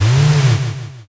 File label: servo